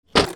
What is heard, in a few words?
DOORS